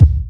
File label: Kicks Loud